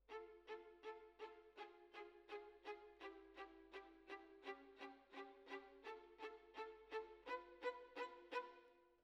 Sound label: Violins Over